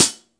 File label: HH, Drum Dry; East Collexion; Drum 1 Dry